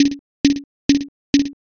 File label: fs gym